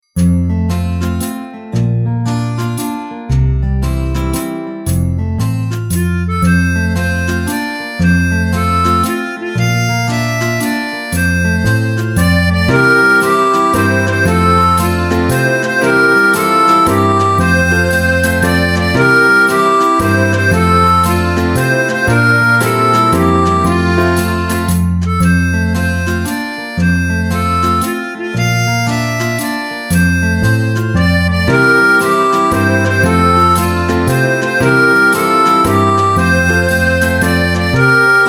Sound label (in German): Kräht der Hahn früh am Morgen in F